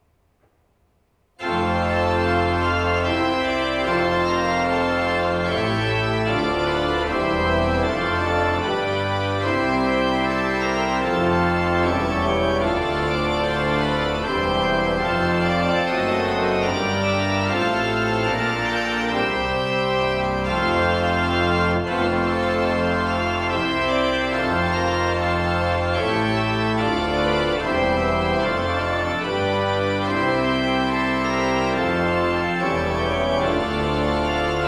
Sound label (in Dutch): Psalm42 zetting bovenstem, orgel; Beilen; Willem van Twillert